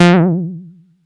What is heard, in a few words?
Bass Juno F; Vengeance Samples